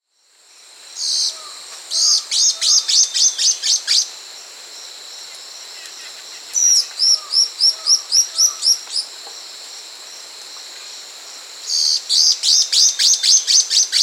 White-eyed Antwren (Myrmotherula leucophthalma), Peru; S. America